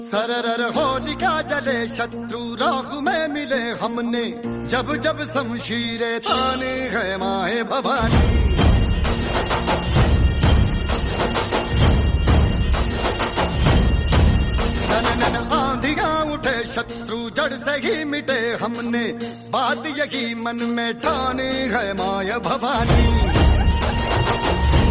Song Ringtone; Tanhaji

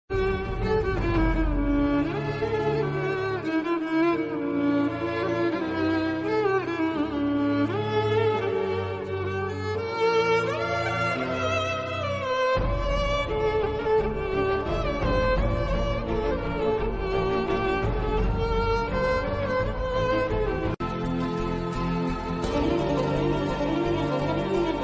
bgm ringtones; hello movie

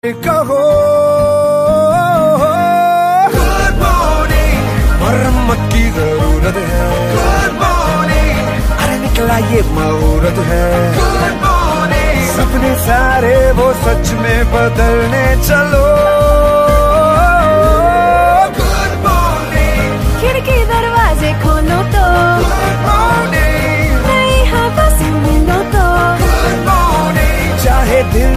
Good Morning Song

